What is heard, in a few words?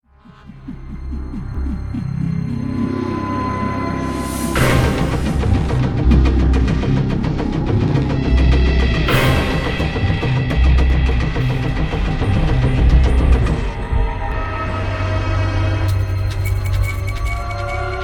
background; action